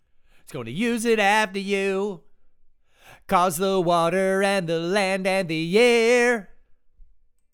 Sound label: vocals